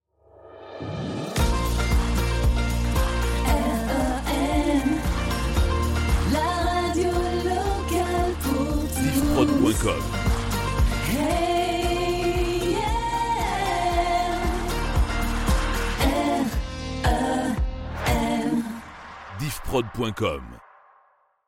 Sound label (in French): Jingle Chante Choeurs